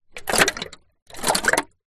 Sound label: drink can shake small amount liquid inside